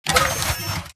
Mvmt Servo Light Medium; AI Stalker; Titanfall 2

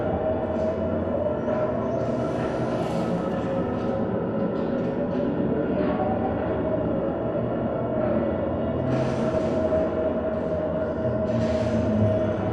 Robot Arm Track Fast Dist; Boomtown; Titanfall 2

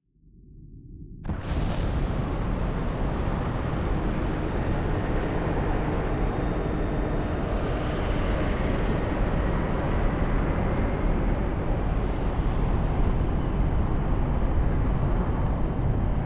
ending fleet; corporate; militia pov; Titanfall 2

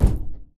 Footstep Jog Far; Titanfall 2

